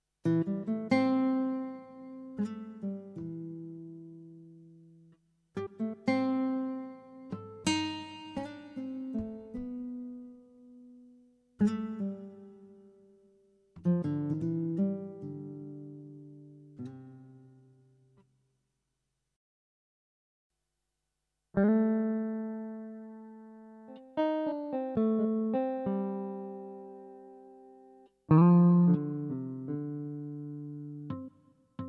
PA900 Guitar

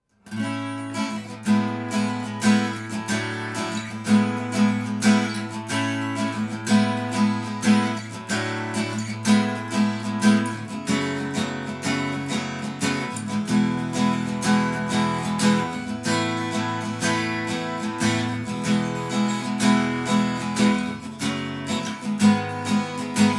Epiphone EJ-200 SCE VS Folky Strumming

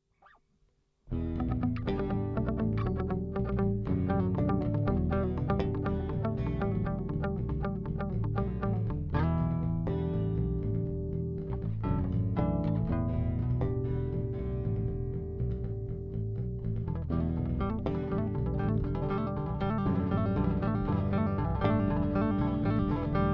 Warwick Star 4 Tracks

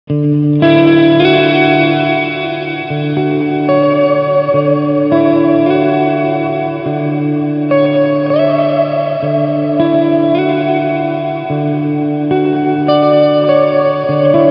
Volume Swells Clean

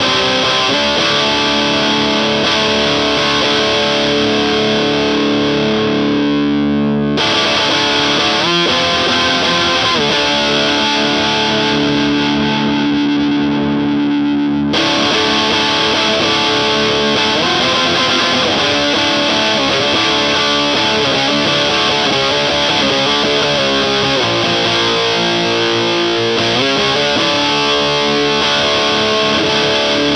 Active Mode Bridge; ESP Ben Weinman

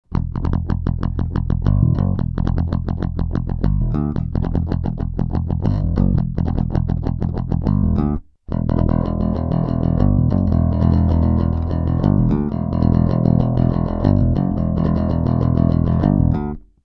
normal pick

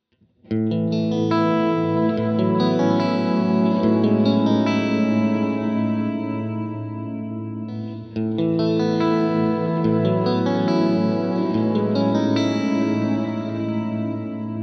Arps; WALRUS LORE

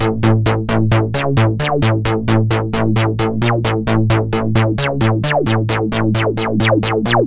Shruti

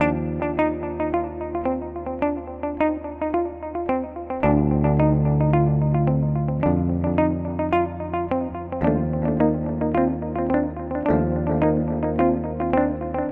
Transistor Tape Delay; Line 6 HX Effects

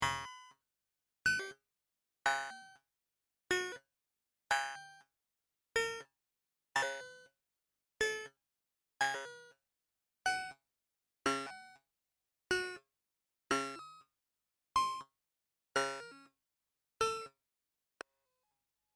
fx ring sync; sys700

